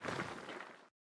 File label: Ground Down; Folk Hero